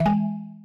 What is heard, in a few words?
UI Cursor